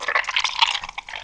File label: oil drop; pod skyrace